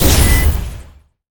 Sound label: Mech Bull explo; monster